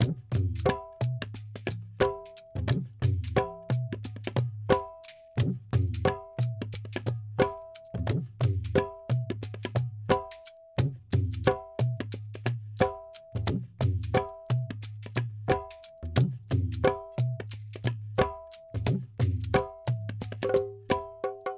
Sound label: perc mix 089 no duff, Hip Hop India, 089bpm; Hip Hop India Modern Percussion; Apple Loops